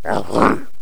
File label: mummy ack